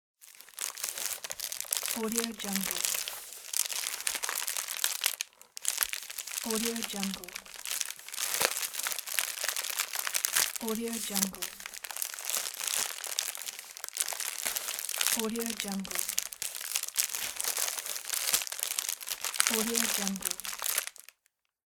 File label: bubble wrap crackle; applehillstudios